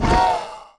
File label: pain